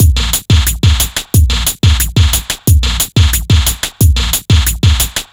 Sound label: tomsquash 090bpm; Zero-G; Downbeat Dance Grooves